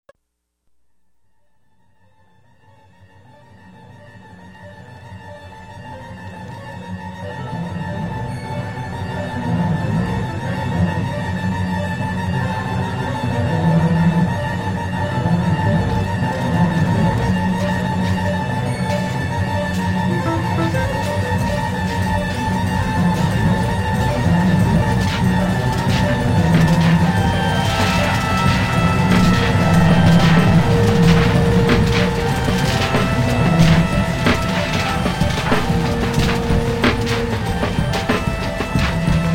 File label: Wave Noise; Muzik 4 Machines